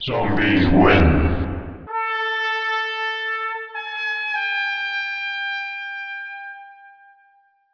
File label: zm win